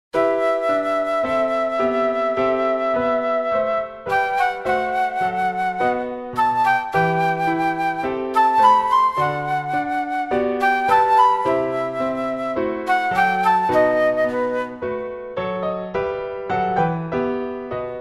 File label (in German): Adagio Floete Klavier; Sonate E-Moll; Haendel; Hallenser Nr2